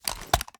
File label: wfoly plr sm reload xxmag start, iw8; gmod; uzulu